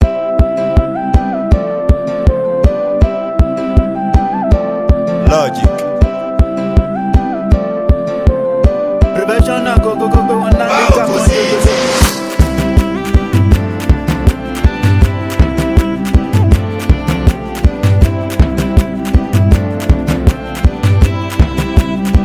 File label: Professional Beat